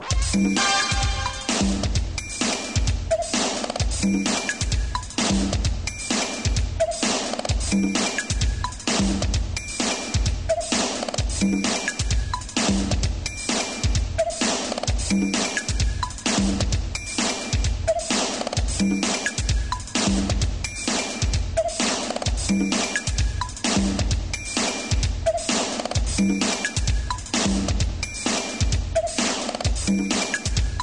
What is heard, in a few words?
drum; PoleChudes